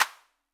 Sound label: clap; MFB-522